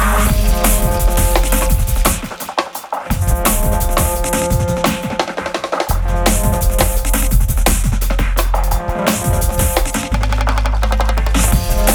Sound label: Jungle DNB Improv